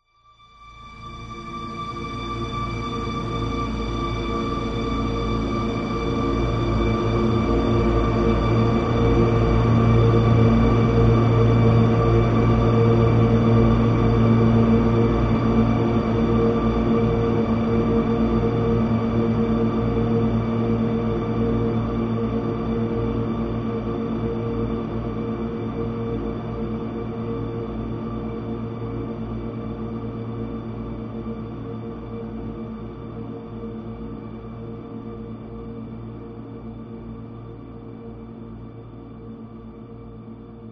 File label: horror swell long dark strings airy reverberant sinister; zapsplat